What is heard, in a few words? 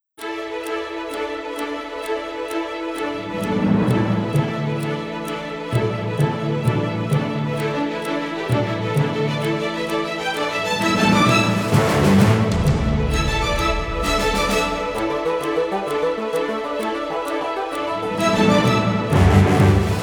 Heated Debate, NEWS BEDS